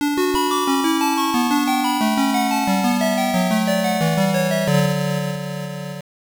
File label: Bonus Stage Clear; Spatter; System 1; arcade